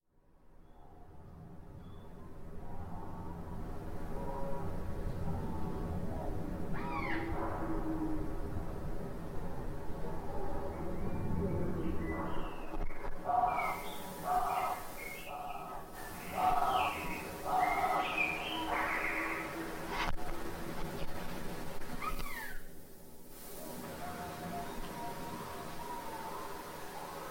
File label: field recording EMGs drum machine noise reduction; experimental; Medial Dark Ages, a Live AV Performance by Laura Netz consisting of experimental electroma